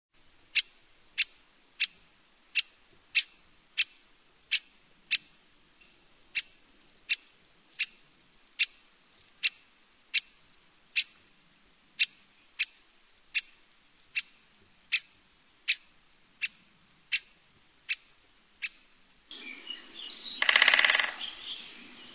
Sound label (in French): pic epeiche